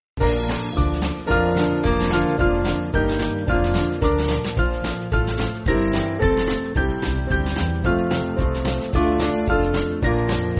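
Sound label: Band, Music Backing Files for Churches; Triumph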